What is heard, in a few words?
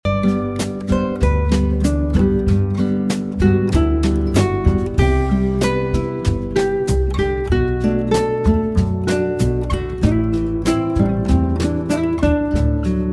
bgm daylight